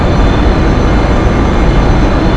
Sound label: Engine; VMViper